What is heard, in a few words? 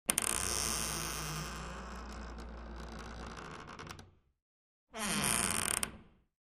Door Wardrobe Creak; hinges & creaks